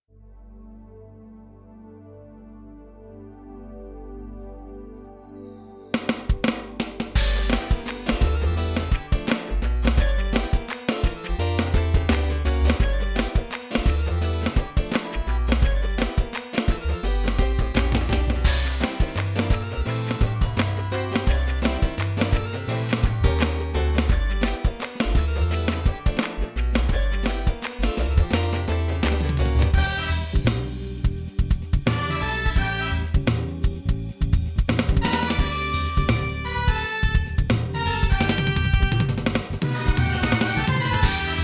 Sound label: Roland TD-6